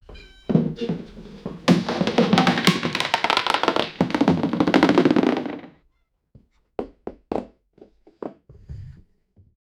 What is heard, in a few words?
clown family falling down